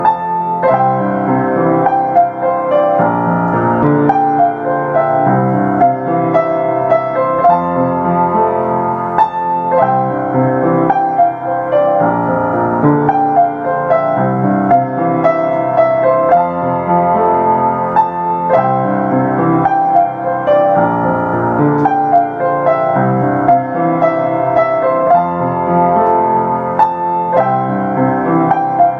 Piano Messenger

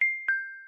Samsung S9 Ding Dong

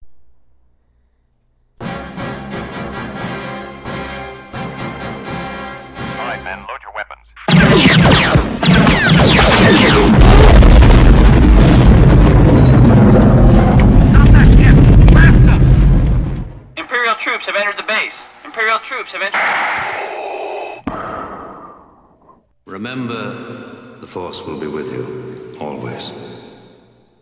Blast Sound

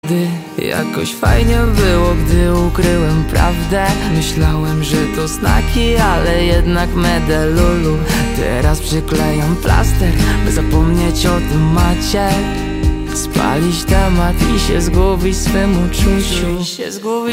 love song sad; dzwonki na telefon

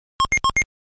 Game Boy Pause

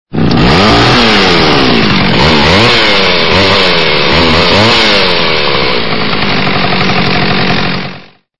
Clown Horn; Efekty Dźwiękowe

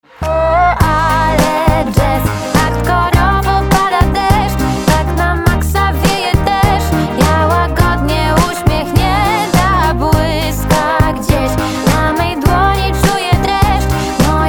Jazz

